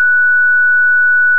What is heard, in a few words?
loop player ear ring